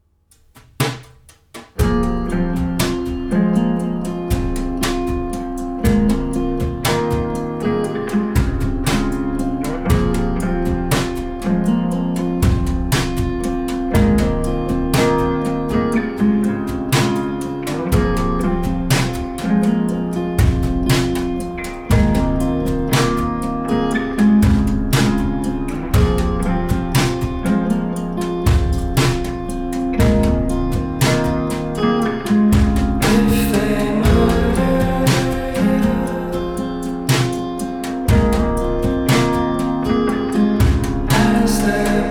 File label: sad sad song